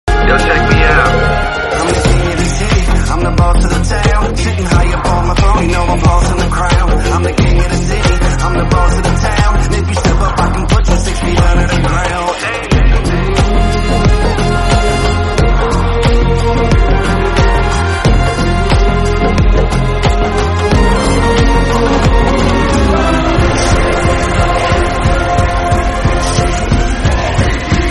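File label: background music Malayalam Ringtone; Kok King Of Kotha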